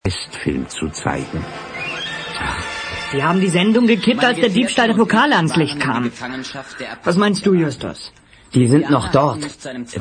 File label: western